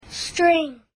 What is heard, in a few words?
string